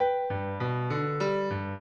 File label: piano; Mozart